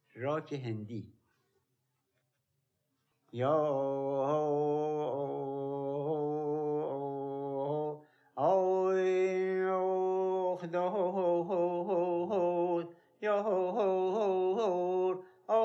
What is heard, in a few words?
Rak e Hendi Mahur; Dastgâh Mahur; Radif-e Davami